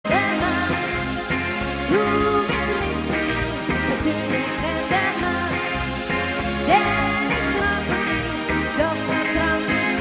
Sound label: Jive